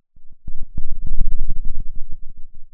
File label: pop, Sound of a thunderstorm